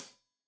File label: Hat Tight, Hi-Hat, Cymbals; Deeply Sampled Drums